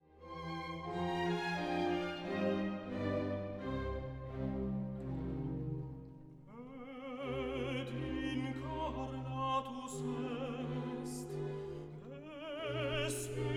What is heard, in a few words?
Credo Et Inc tenor